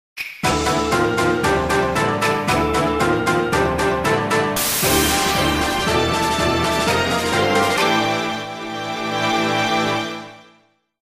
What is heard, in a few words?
Fanfare (Level Up!); Conquest